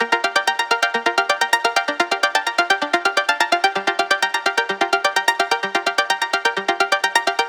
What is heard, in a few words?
128BPM Melody; Vengeance Samples; Unimatrix Kit